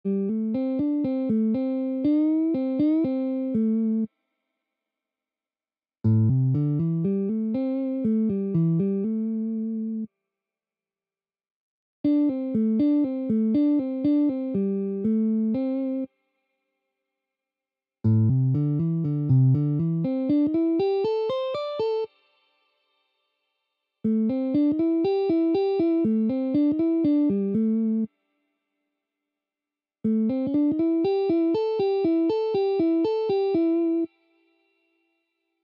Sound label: Easy Blues Licks